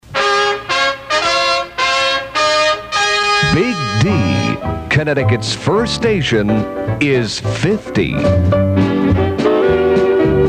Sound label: inst jingles; Ross jingles; instrumentals from WDRC's 1962 package